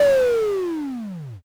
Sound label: power off